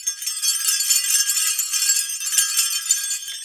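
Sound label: Tanzania bells; Roland LCDP14 Africa VOL-2; Perc FX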